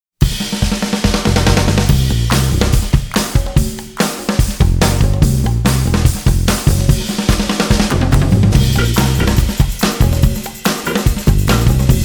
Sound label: Full Mix Distortion, drum tracks